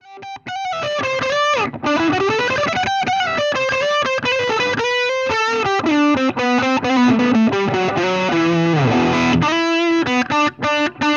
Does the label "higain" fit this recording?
Yes